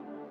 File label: lead